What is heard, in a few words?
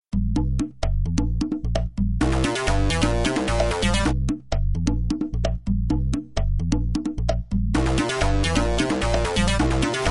rhythm